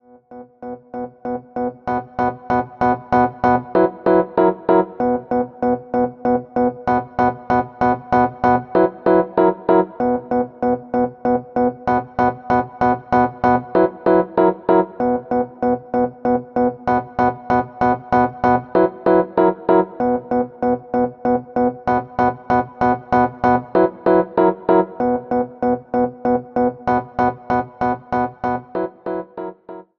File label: Rhodes chord, hip hop chords; rhodes chord 16